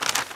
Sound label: stamp